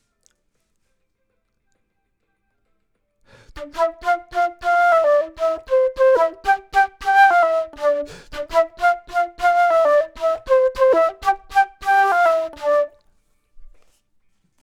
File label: flute; logic